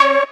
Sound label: Mainlead Melody